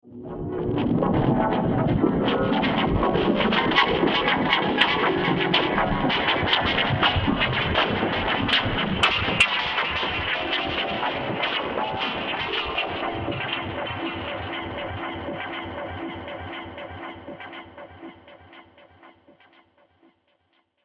Where Off Earth Are We, science fiction; Science Fiction Sound Effects

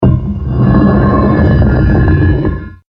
Secret Stone Door; Twistedtracks

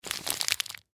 Plastic Bag Handle; Rustling Sound Effects; Objects; Foley

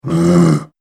Orc Bored; Monster; horror

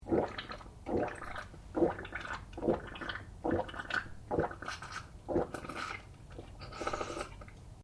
MALE DRINKING JUICE CAN; Male Vocal sounds; Human

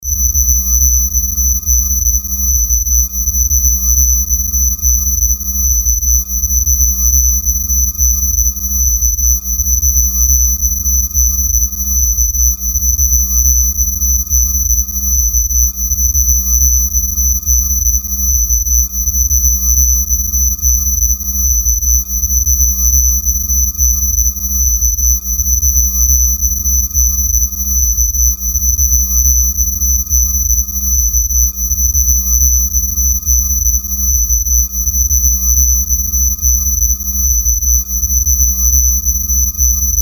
Bed Low Hum High Frequency Distortion; Bed Sound Effects

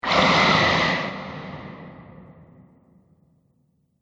Fire Breathing Monster; Monster Sound Effects

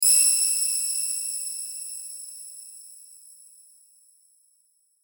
SHIMMER BURST, Shimmer Burst High Airy; High Tone; Blastwave

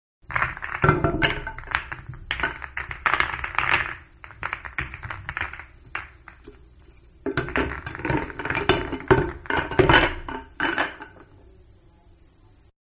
Billiards Rack em up; Pool (Sound Effects:Sports); Manhattan Production Music

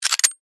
Steampunk Switch; Steampunk Sound Effects; Steampunk (Sound Effects:Science Fiction)

